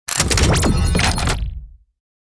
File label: Reload Large Laser Power Injection; Robobeat